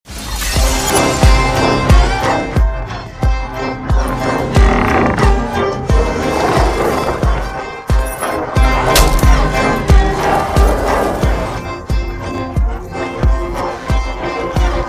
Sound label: trailer bgm; kunchacko boban; fahadh faasil